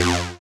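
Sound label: synth flange, dance synth; Sound & Vision - Gigapack I CD 2 (Roland)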